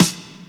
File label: SD HOU, Dance Mega Drums; Best Service